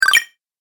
Game Health Points, Video Game Sound Effects

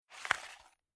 Pulling Sliding Spanner Out Of Tool Set

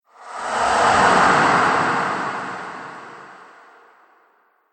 Pyramid Phantom, ghost sound effects; Andrew Fleming

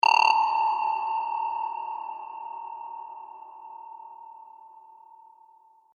Game Cavern Tokens; Video Game Sound Effects

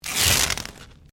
Sticky Tape Rip; common tape; Offices

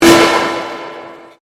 Convolution Pulse

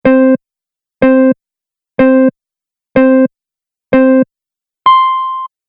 Race Countdown Beeps Long; Beep Sound Effects

